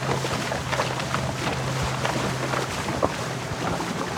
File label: Auto Tires Water Loop; Automobiles